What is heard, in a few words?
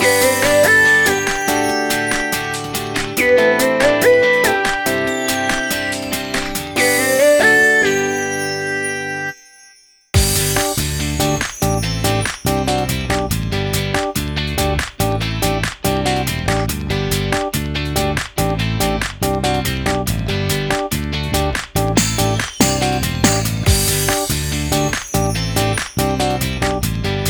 Winning Jingle World Complete; Super Monkey Ball Step & Roll